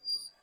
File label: squeak, park; soundscapes